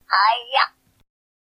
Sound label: Wiimote Sound; Luigi